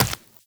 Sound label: Run Flesh; Footsteps; Warframe; Tenno